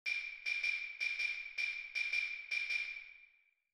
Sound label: Claves; unmittelbar gegenschlag; Instrumentenkunde